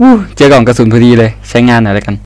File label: rage; ff2